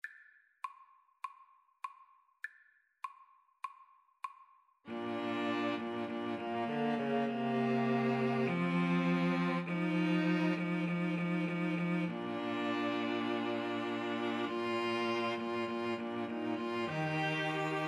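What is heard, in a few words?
string trio karaoke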